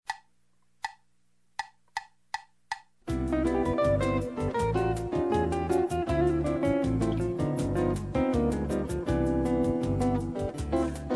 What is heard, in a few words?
Jazz riffs Turnaround in C